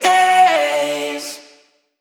Vocal one shot D; Slate Digital Omnivox Vocal Sample Pack

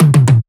tom, Drum One Shots; Slate; SD MIDNIGHT Sample Pack; Toms

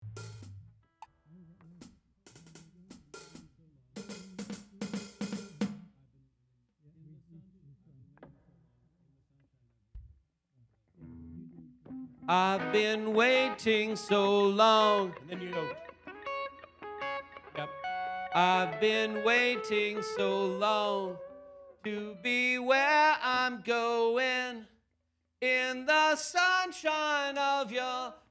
Vocal Emphasis Mix

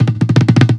tom rolling; Drums; One Shots; Slate; AURORA Sample Pack; Toms

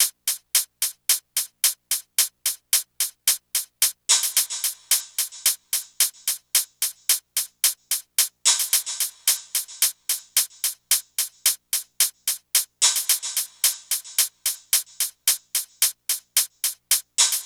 hat 110, Drum Loops, Hat Loops; SD MIDNIGHT Sample Pack; Slate

